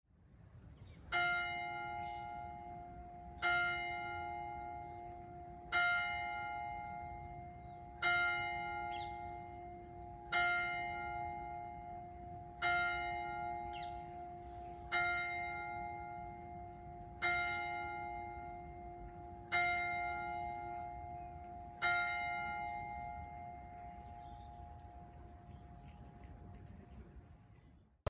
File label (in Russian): kolokol 10 chasov daleko